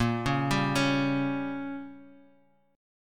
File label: A sharp Minor 6th, down, Guitar, Half Step Down